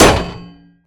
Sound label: Exo Hit